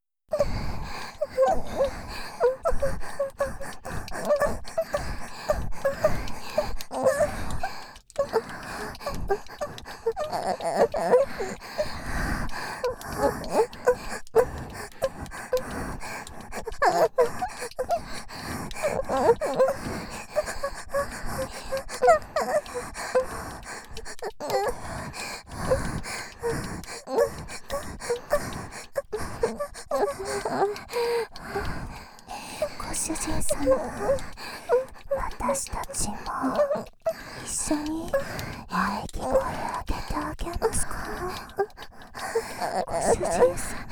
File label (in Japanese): SEあり, ひたすら女の子の喘ぎ声; メスイキループ; ひたすら女の子の喘ぎ声でメスイキサポート